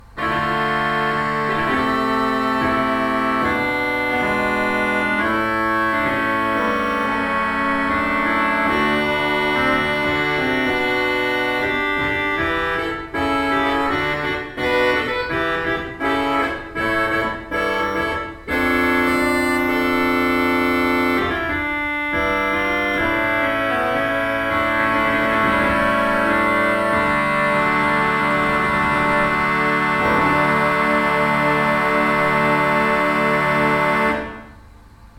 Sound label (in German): Walcker, gebrauchtorgel